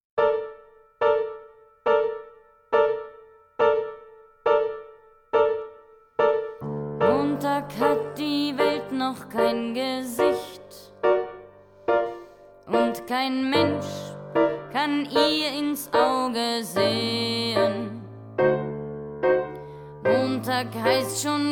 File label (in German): Chanson; mascha kaleko chanson